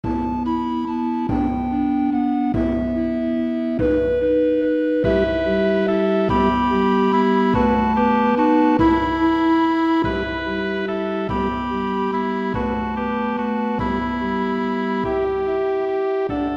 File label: Oboe Track with Melody; We3Kings